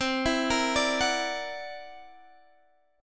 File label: C 9th Flat 5th, Guitar DADGAD, chord